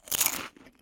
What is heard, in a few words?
slime chomp; slime rancher